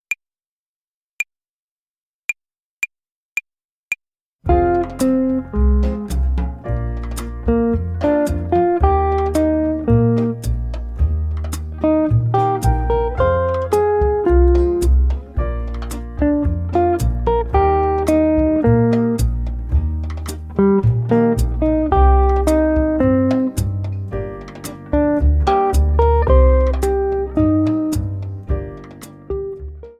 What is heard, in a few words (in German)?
Akkordzerlegungen Übung 6, Play-along Slow, Gitarre; Jazz Club